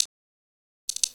Snappy Riff, Percussion; Sonic Foundry (Sony Creative Software); Futurist Drum & Bass

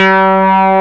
C3, MEMORY MG; East West - Global Sounds

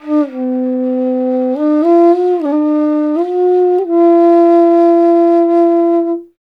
INDIA ALTO

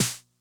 Snare Low Tuning High Tone Max Snap, 909; classic machines

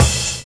CRASH FIL; Univers Sons

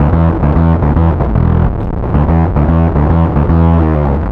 Bass, Distorted E; Bass X

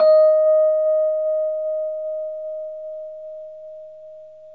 Rhodes D#; 3D Sound; 3D Keys VOL-1

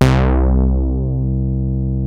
OSCAR BASS, OLD SYNTHS; Rubber Chicken Software Sample Bank

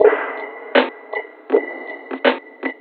Subtle Bumpers, Fx; Sonic Foundry (Sony Creative Software); Esoterik Beatz

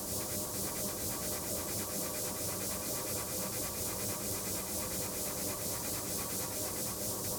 Moving Noise 130, Tempo Loops 130bpm

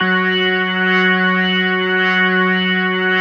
F#3S, B3, Vintage Keyboards

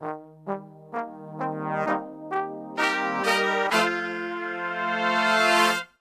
FANFARE, Brass; Best Service; Brass Super Section

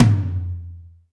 tom lo, Alesis DM5

